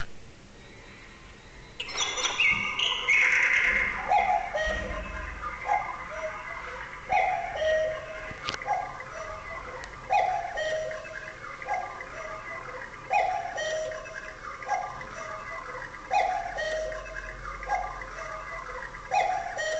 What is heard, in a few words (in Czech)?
ukazka zvuku odbijania v celu hodinu